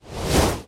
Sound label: woosh out